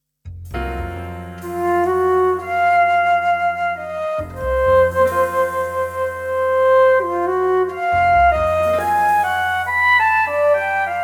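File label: Jazz flute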